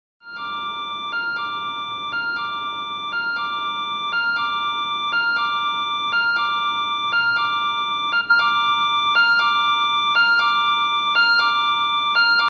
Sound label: Door Chime